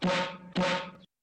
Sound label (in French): silencieux; notification